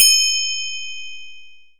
soft, Indian Percussion Tabla; Mango Loops

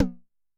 Tom, Yamaha DTXpress II

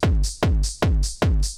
Bite Size Beats

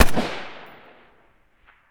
PRC RUGER, Guns Tuned

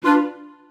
orch sting; musicradar; cartoon caper

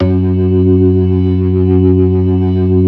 ORGAN 4 DRAWBARS; Keith Emerson Signature Series

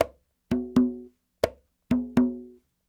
Apentemma, World Percussion

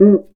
CUICA; ILIO

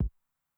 BD Low Tune Short Decay, bass drum, 909; classic machines; 909mod

